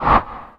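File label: button hard noisy close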